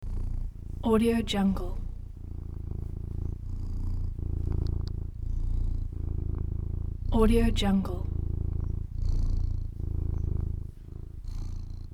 cat purring; taramundi

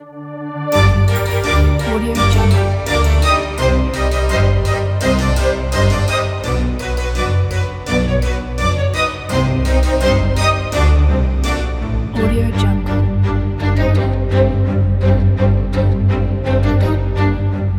halloween logo

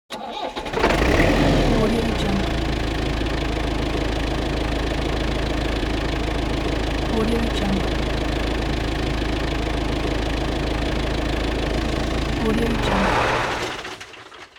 excavator engine start and stop

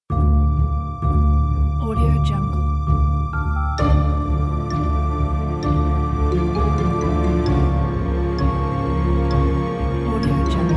cartoon sneaking evil intro ident

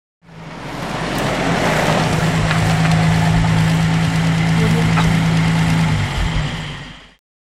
old Wolseley car comes to a stop and switches off; hollywoodedge

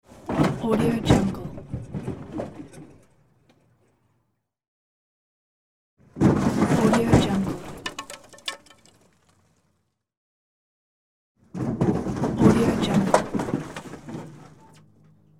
throwing junk sounds

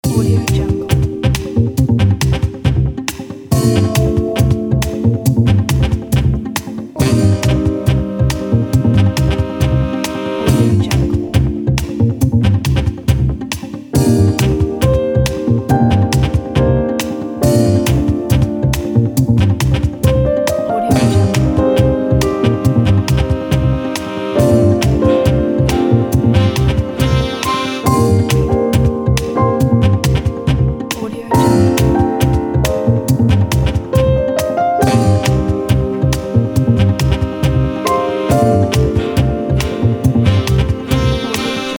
action jazz piano groove

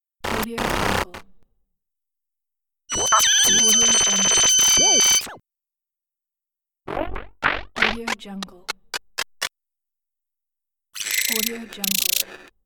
sound glitches

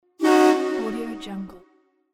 air horn blowing

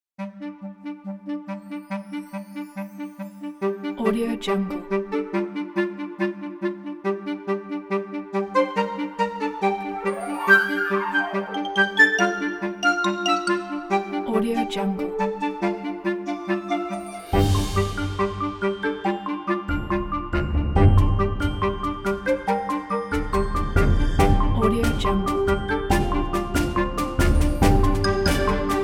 adventure story

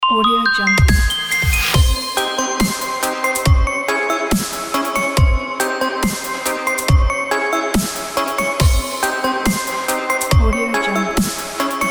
scary bells and dubstep

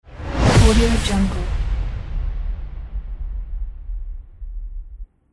whoosh sfx

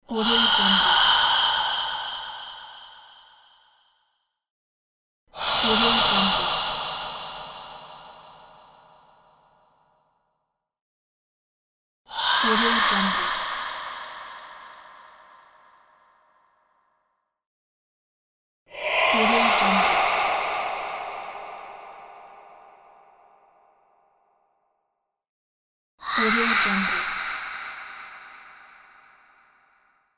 exhale

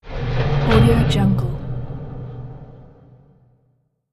dungeon lock

